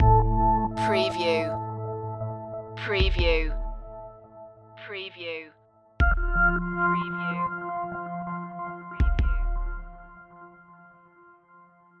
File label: deep space loop b